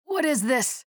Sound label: pain ability damage; nyx; low health